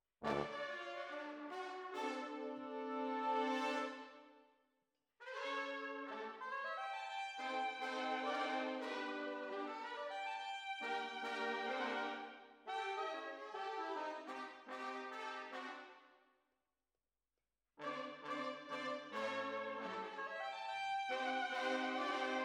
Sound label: Tromb ASS